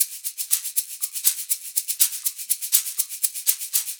120 SHAK, Percussion Loops

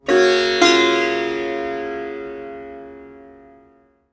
SITAR CHORD; SITAR CHORDS

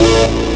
victory guitar; ST-XX Amiga Soundtracker Sample Packs

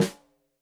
Snare Stereo, Drums; Deeply Sampled Drums; SMDrum

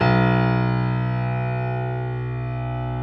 B 0, PIANO; AKAI S-Series CD-ROM Sound Library VOL-8

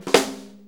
4-4 Snare Flam, Drum Loops; Drum Tools; Flams

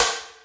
HIPHOP SN; Big Fish Audio; Synth City

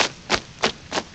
MARCHING; CMI Sound Library Rev 1.3

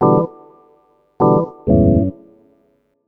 Organ chops (125BPM), retro house

